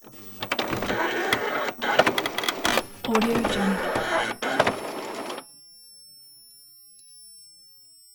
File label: printer homeoffice; soundbits-soundfx